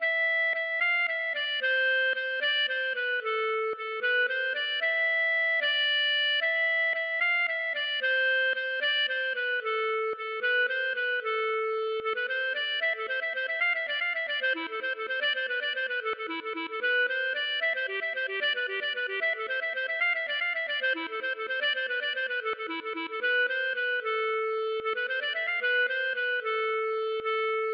valse

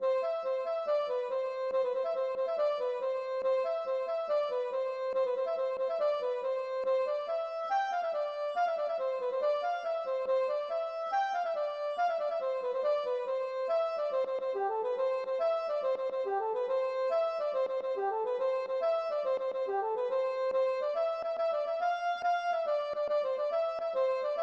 rondos

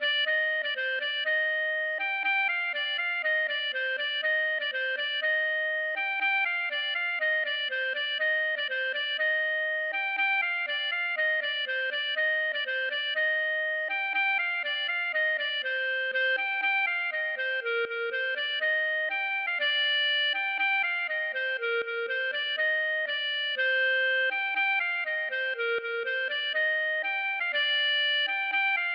marche

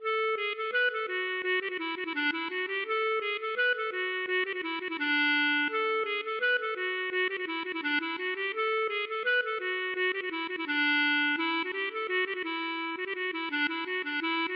bourree Carree

